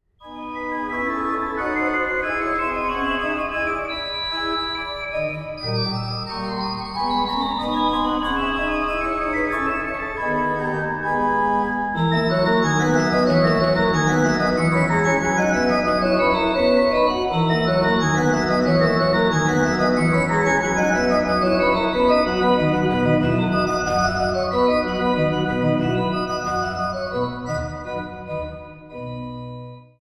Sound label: organ; microphone test with organ